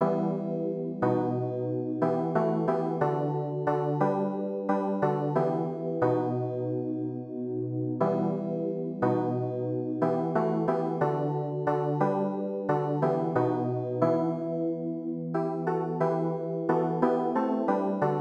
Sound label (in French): SANCTUS CLAVIERS